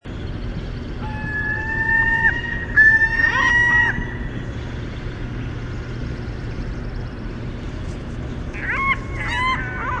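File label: Western Gull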